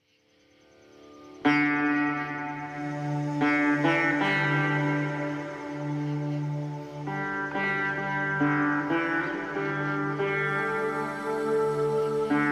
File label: Festive Massage Sitar